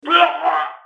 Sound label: scream